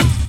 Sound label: DR. LOOP; X-Static Goldmine 3